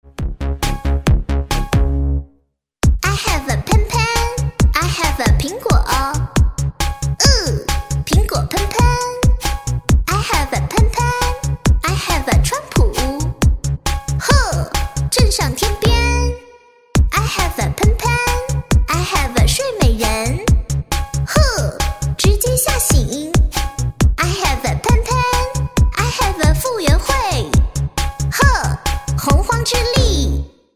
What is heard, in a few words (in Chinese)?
女 翻唱